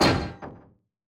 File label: frag physics bounce riotshield; gmod; viper